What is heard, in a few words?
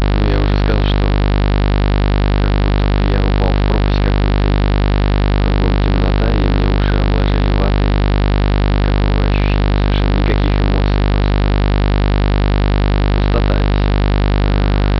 buzz 50hz, TONAL NOISE